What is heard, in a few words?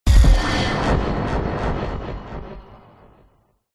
sound design cinematic impact futuristic sci fi; zapsplat